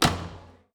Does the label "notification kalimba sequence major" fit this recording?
No